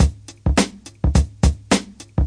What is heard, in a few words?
105 funky